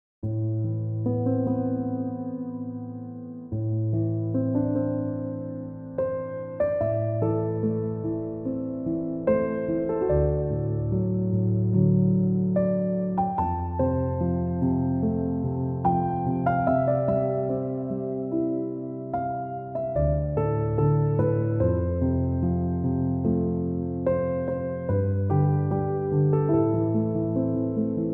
chill piano instrumental; Frozen 2

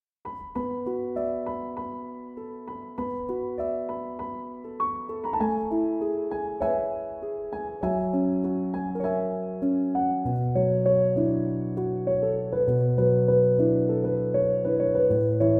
piano instrumental

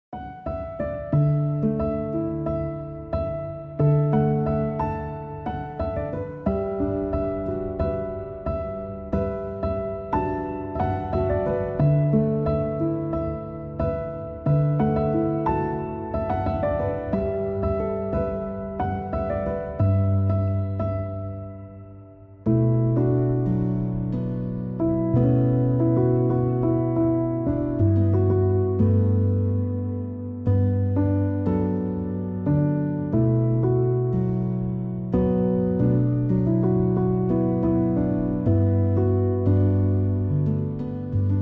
piano instrumental

